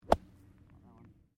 Golf swing wedge bunker; Sports; Various Golf sounds

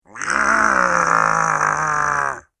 Frustrated Fiend, monster; Monster Sound Effect; Monster Sound Effects

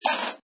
Tui cackle, Exotic bird - New Zealand bird; "Tui", cackle sound. (mono); Bird Sound Effects

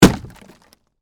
Impact Wood Hit, Sledgehammer, Debris; Hammers; Tools

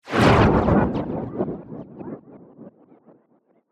Plasma Shoot Whoosh, Scifi, Swirl; Science, Fiction; BlastWave

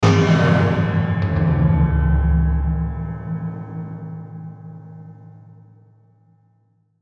Hit Slow Deep Metallic, Hit Hollow Reverb Tail; Production Element Imaging Element Accent Transition; Blastwave

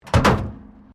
METAL BIN IMPACT PLASTIC CRASH BARRIER

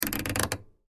Television Channel Change Antique Click; Pull Switch Turn Dial; Historical; Blastwave

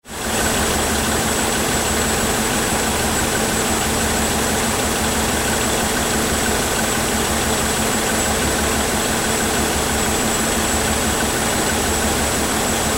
Ship Large Car Ferry Machine Control Room; Tom Hutchings

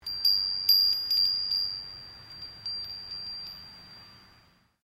Wind Chime Japanese Bell Type; Various windchimes; Musical

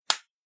Slap Smack Sound; Slap - Smack Sound 3; Slap Sound Effects; combat; Human Body Sound Effect; Andrew Fleming

